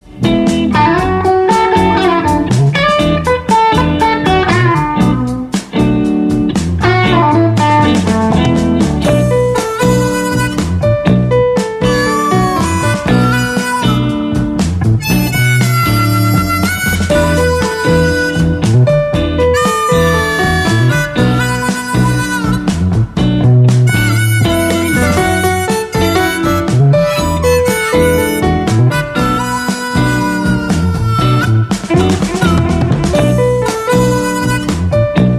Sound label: piano